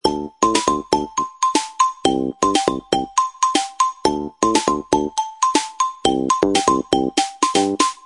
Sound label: Pop, ringtones; Okapia; Spark